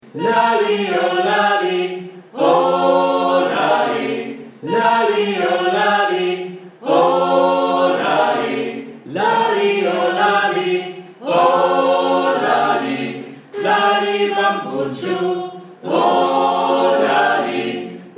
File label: voc